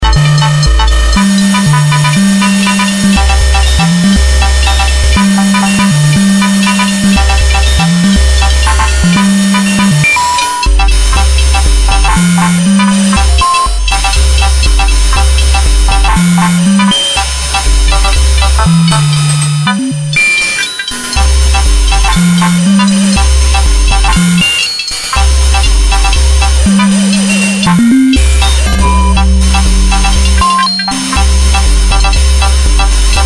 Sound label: PSR-12, Circuit Bent